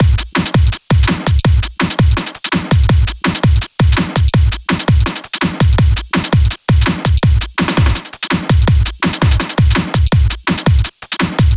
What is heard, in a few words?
Beat layer loop; vostok inc. switch gamerip 2017